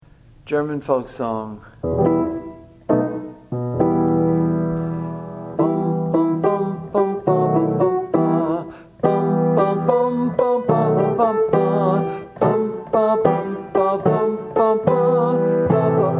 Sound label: German Folk Song